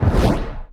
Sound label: Missile Mine Launcher Shoot